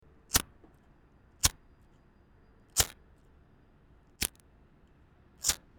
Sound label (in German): Feuerzeug; Geraeuschesammlung